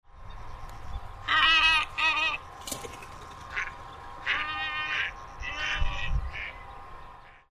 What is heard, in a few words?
glossy ibis